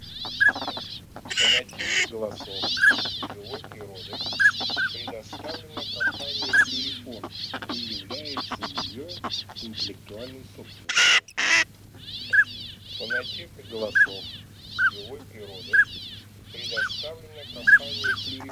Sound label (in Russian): Шилохвость Codone; plurifon